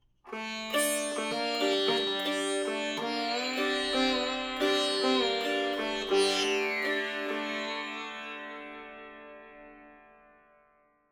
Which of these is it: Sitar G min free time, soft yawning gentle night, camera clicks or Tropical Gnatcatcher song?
Sitar G min free time